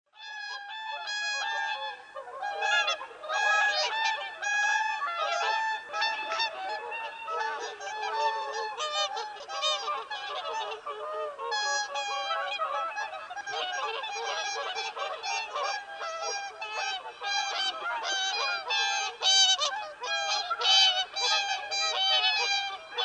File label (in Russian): lebed klikun golos